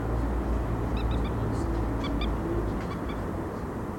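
Moorhen call, Digital audio recorder and parabolic reflector, kek-kek notes; nocmig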